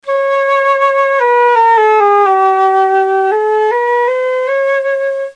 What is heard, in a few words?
flute down n up; Flutes